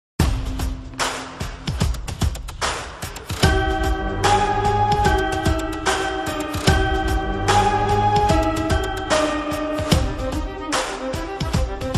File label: BGM Ringtone; V Movie